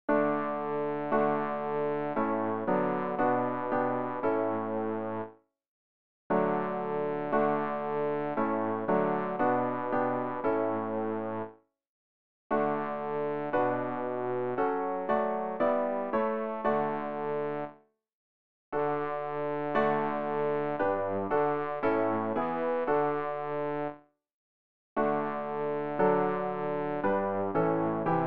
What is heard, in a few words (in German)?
bass, Übehilfen; Ref. Gesangbuch; RG 102: Halleluja! Singt dem Herrn